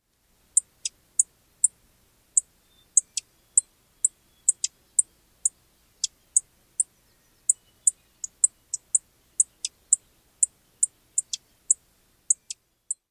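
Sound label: two call types, Lincoln's sparrow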